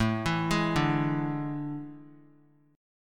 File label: A Major 7th Suspended 4th; AM7sus4; arpeggiated; JGuitar